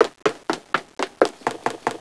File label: WALKING